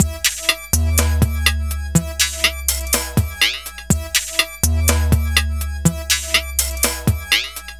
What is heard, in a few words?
Elektro, Processed Percussion; Sonic Foundry (Sony Creative Software) - Rhythmicronics Processed Percussion